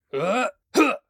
attack; Galien

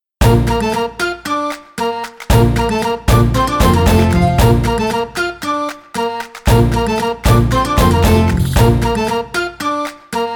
Tavern; Sea Dogs To Each His Own

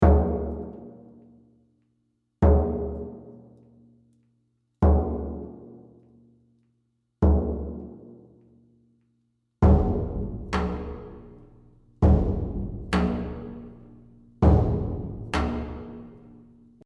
Battle Intro; Infinity Blade 2

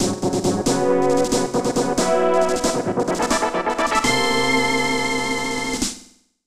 Floor Fanfare; magic sword; snes

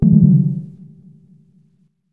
Memory Card Erase; GameCube System Sounds; GC

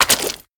Footsteps Swamp

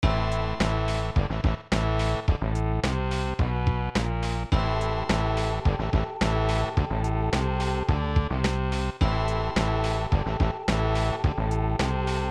Tension

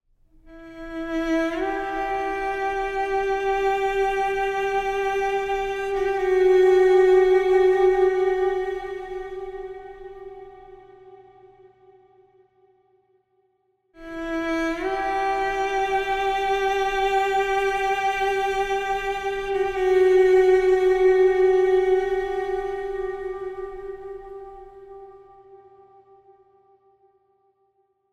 Theme Soft Orch; Lab Operating Room

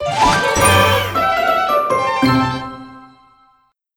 Crunchy Finish

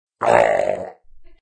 raaar; Chibi Knight

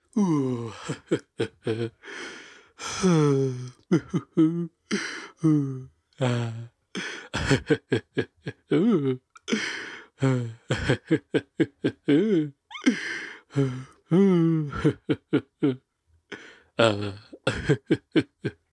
content loop; Fat Grubfather

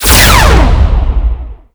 Fire Ectoball; Nicktoons Nitro; arcade

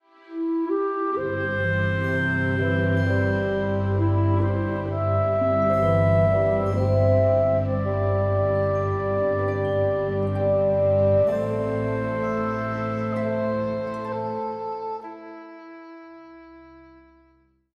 Jingle Romantic Kiss

